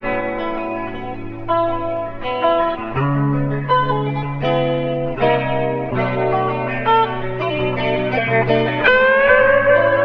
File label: guitar, Jazzmatazz loops